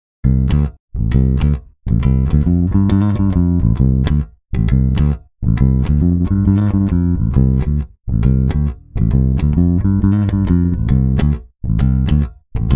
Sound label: JB neck